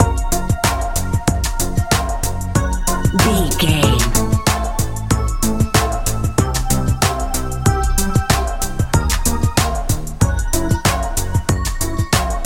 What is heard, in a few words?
pop dance